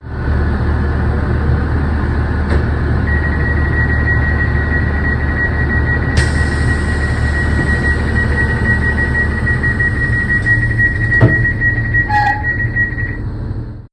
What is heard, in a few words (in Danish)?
ic3 door lukning; IC3 dørlukning; Nikolaj Barfoed-Thaysen, Juli 2022